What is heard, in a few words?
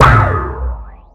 STAB